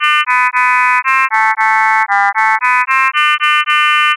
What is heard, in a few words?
harmonics 4 to 10